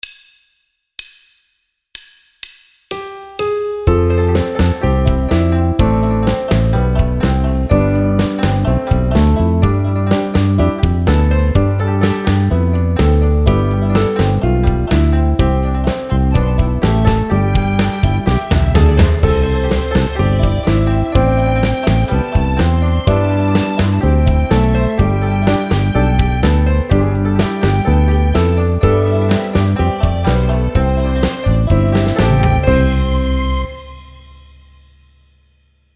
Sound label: Chinese New Year tune Piano Accompaniment